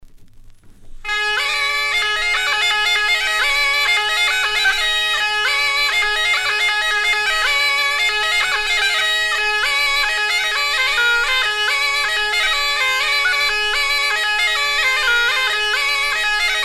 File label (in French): La Grande Bourrée; EICOLO; Mount Gargan